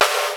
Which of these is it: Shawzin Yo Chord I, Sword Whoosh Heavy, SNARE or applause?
SNARE